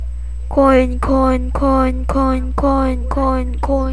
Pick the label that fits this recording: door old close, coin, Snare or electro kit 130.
coin